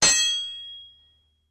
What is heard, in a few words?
Hammer Hitting An Anvil